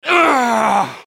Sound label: death; shaoxiadiablo